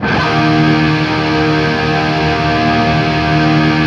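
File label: power D# loop, guitar power chord loop; East West; Steve Stevens Guitar Samples Collection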